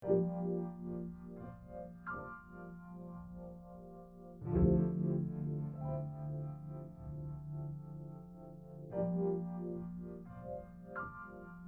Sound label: Piano Chords